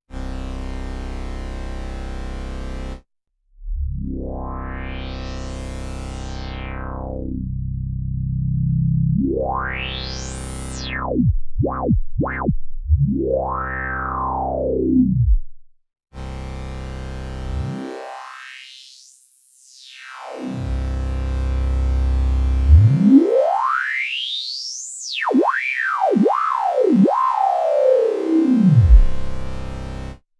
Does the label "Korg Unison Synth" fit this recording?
Yes